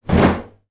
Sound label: damp towel drop into bathtub distant roomy perspective; foley